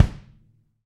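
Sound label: BD, kick drum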